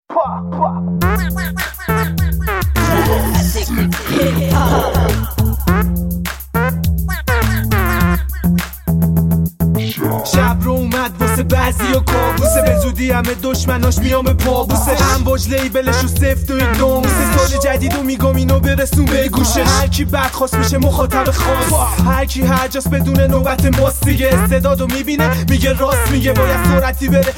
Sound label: Classic Hip Hop